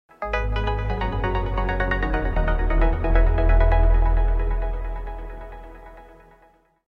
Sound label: Sms